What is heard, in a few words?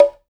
ETHNIC DRUMS; Spectrasonics; Liquid Grooves 1 & 2